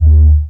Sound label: Laser B Fire